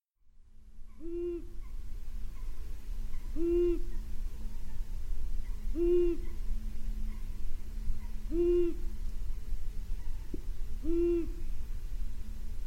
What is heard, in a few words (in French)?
Moyen Duc; nuit de la chouette